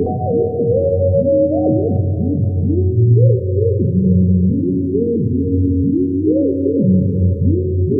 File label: Psychic Theme; Sonic Foundry (Sony Creative Software); Loop Noir; Session 08 - Psychic Investigator